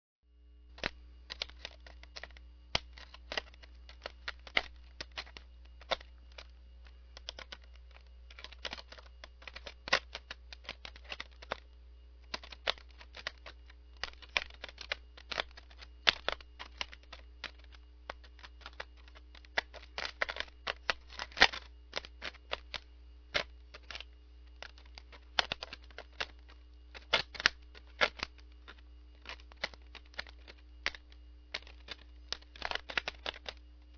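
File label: butterfly sound; babochka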